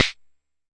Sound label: Jomox MBrane, drum machines; Jomox MBrane 11